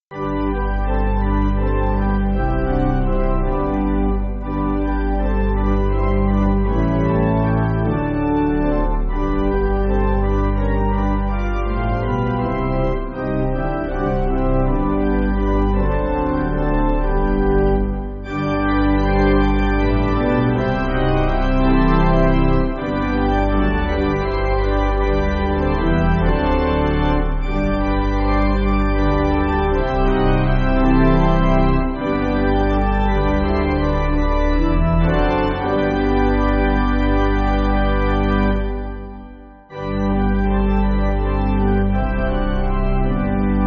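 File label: Pipe, Pipe Organ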